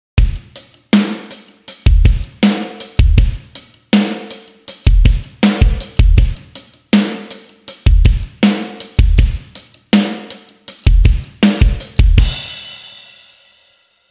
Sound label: Big Room Half Time 160bpm